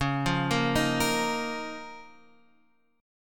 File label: C sharp 6th Add 9th C sharp down